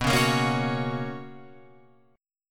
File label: B Major 7th Suspended 2nd Guitar Open F, chord; BM7sus2; strummed; JGuitar